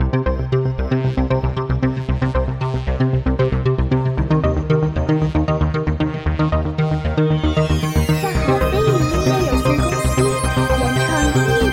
电子激烈紧张战斗

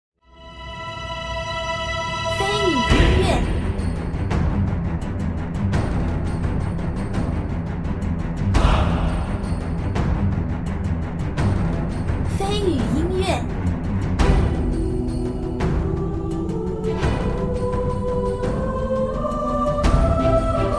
史诗 气势 恢宏

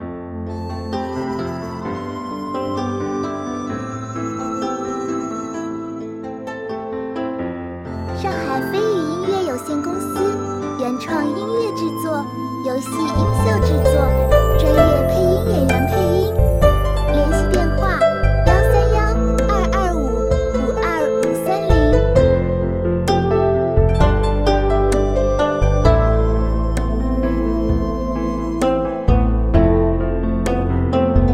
悠扬-舒缓-中国风

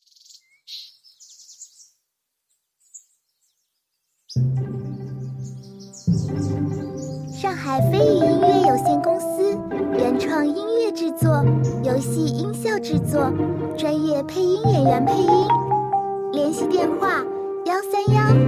Q版轻快休闲; Boom kingdom; 经营

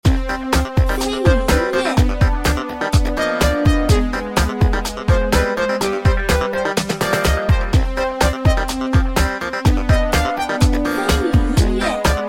恶搞-诙谐-魔幻

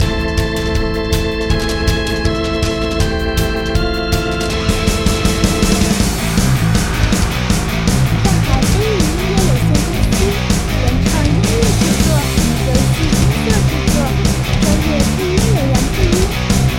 摇滚 压迫 紧张 战斗; BOSS

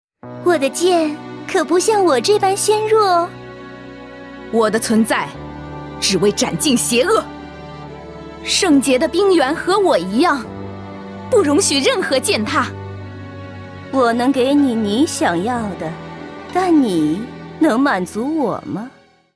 女 BGM

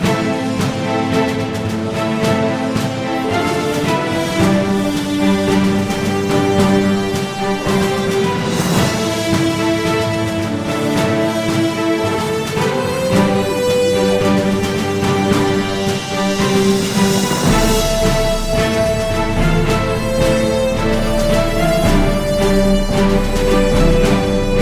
登陆音乐 Login BG

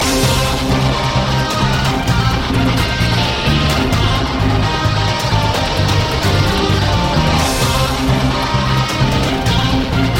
精英战斗

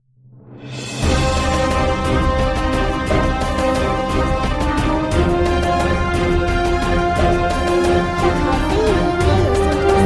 激昂史诗登录; 创龙战记

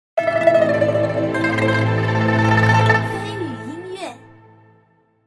失败; 我欲封天

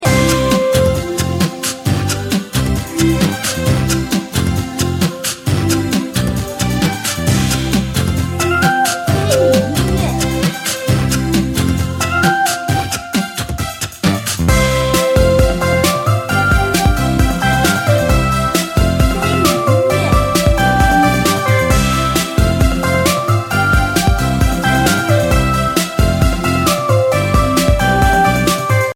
休闲 欢快 放松 活力

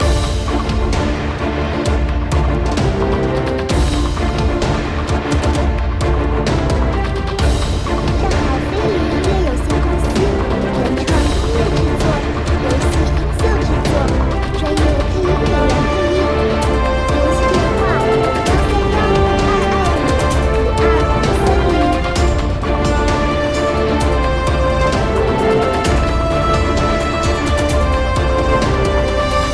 激烈紧张; 德玛西亚

